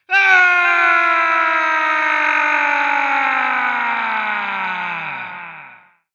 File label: Falling Male Scream